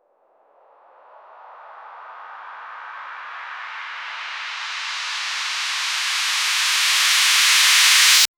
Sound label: swoosh uplifter